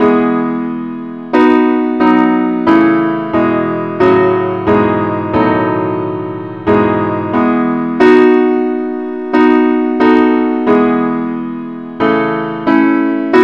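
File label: gwalchmai advance Acc key e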